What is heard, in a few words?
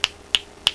SNAPPING, PERC